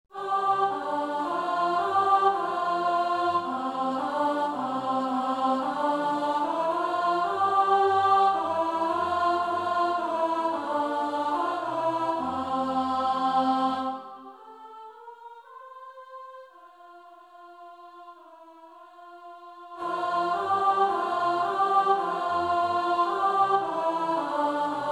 Alto

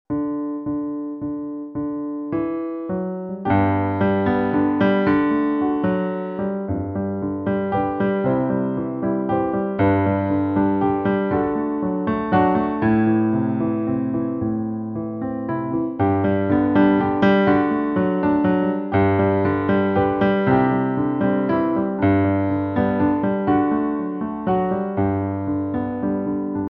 Piano; The Gentle Maiden

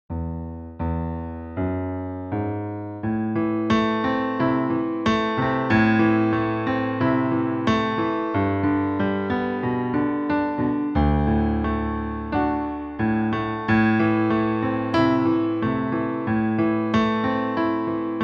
Piano; Da New Rigged Ship

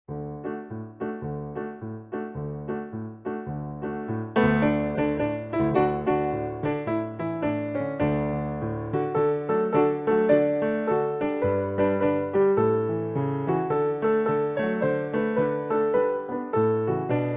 It doesnt come for free Piano

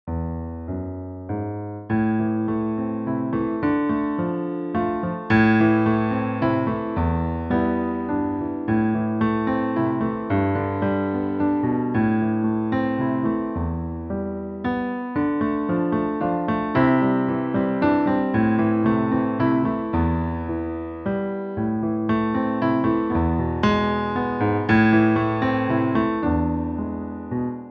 Piano

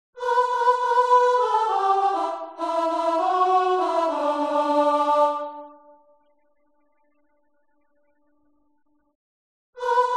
Soprano

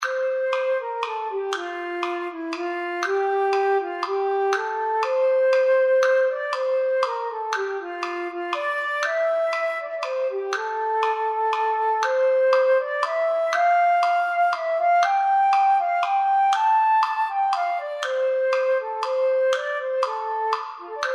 Flute Instrumental; 120 At Speed

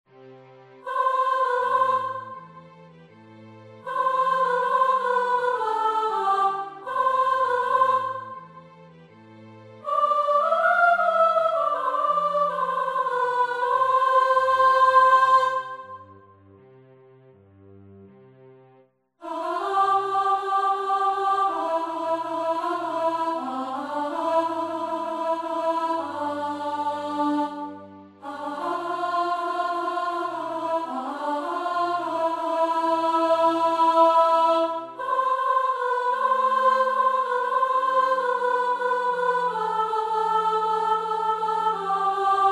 Chorus + Verses Top

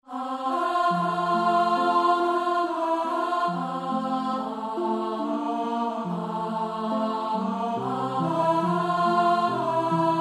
Altos; Calon Lan

